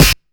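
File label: Flange Snare; Drumset 02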